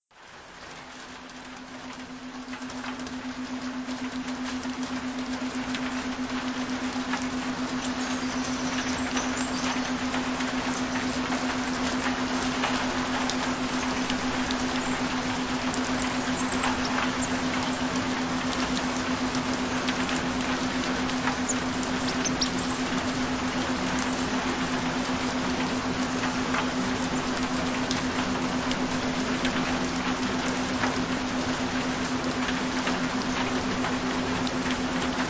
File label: Rain Sounds Subliminal Affirmations Frequencies